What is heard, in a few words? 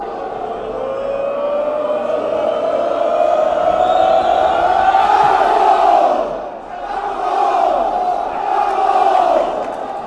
woah hand ball